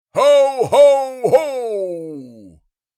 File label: Santa claus jolly laugh; christmas